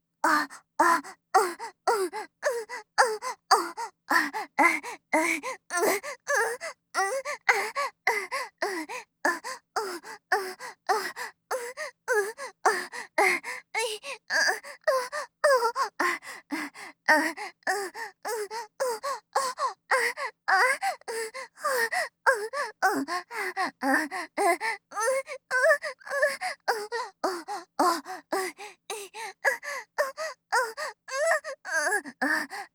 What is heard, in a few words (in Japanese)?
喘ぎ声(大)